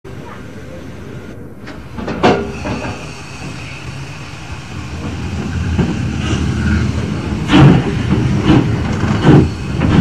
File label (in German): Anfahrt; walsum; Ruettenscheid